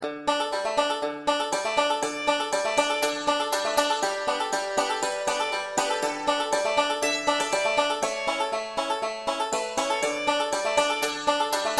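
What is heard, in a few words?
wreck of old 97 accompaniment with melody, banjo; beginning 5 string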